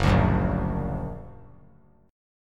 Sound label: Gb Augmented; Gb+ strummed